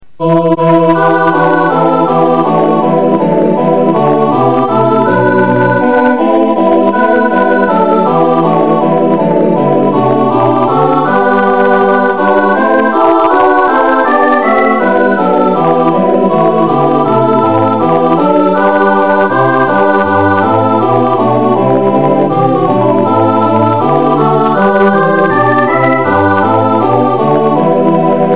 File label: god rest you; Christmas; carol